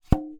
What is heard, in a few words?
Polystyrene Knock